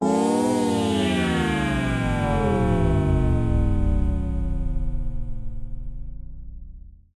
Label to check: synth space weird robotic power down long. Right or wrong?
Right